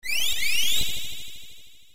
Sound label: healing spell first part; ff3us